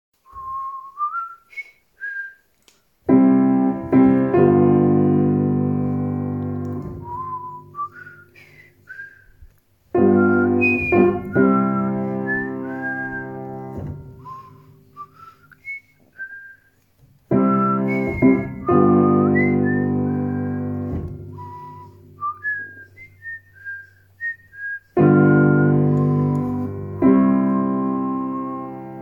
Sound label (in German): Echo Jodel, ganzer Begleit